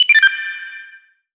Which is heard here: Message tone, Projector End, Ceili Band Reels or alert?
alert